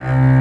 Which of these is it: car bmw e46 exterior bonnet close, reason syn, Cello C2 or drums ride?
Cello C2